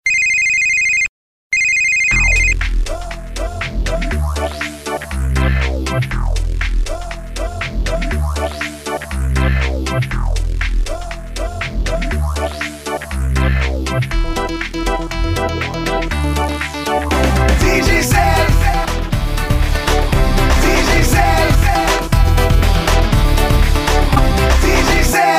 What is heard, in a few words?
Ringtone; Digicel